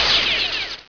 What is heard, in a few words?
GUN EXPL